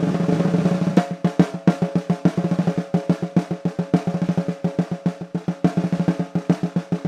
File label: snare drum; gcompris; instruments